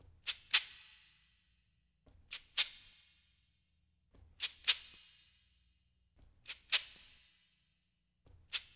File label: LOOP, SHAKERS; Percussion; InVision Interactive; Percussion Slam